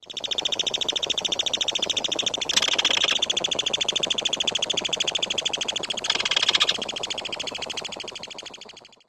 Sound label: begging young in burrow